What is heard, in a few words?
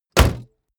car bmw e46 exterior bonnet close slam